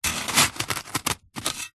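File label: industrial shovel spade metal single slow dig into gravel stones; zapsplat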